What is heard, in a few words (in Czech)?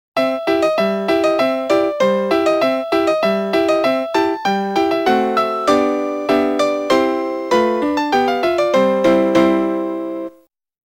melodie